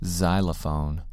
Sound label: xylophone